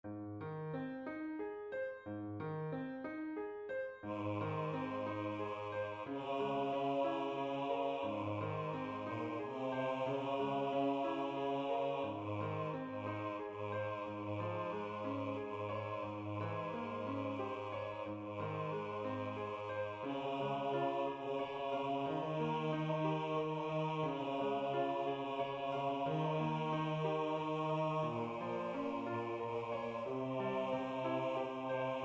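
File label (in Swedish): Jul jul med piano Bas 2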